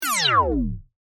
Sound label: Laser Beam Descend, Science, Fiction; BlastWave